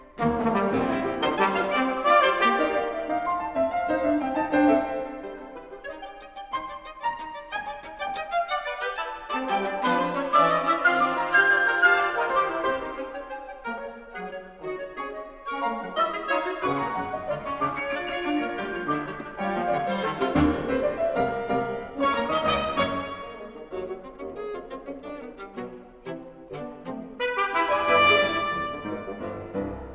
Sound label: divertimento, dance toccata, orchestra